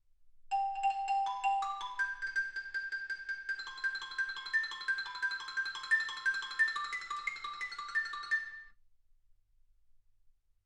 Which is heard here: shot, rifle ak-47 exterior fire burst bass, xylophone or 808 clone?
xylophone